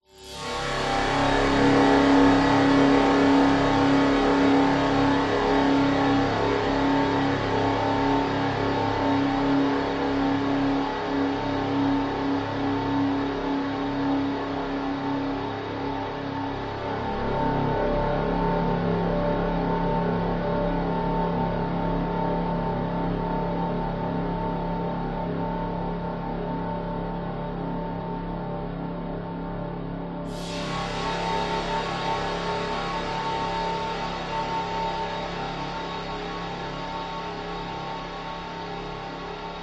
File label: sound design drone eerie sci fi electricity buzz decay